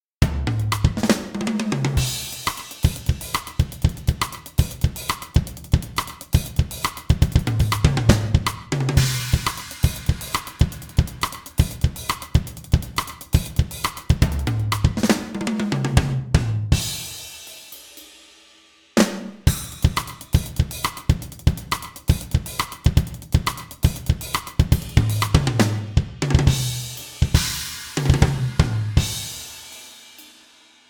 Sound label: drums